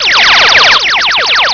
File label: ZAPPED; ROBOWARS